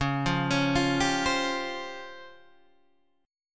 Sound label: Db Major 7th Flat 5th, Guitar, Half Step Down